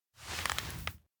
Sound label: map tear wipe; awayuki; anemoi パッケージ版